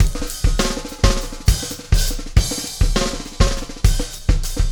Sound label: Beat, Loops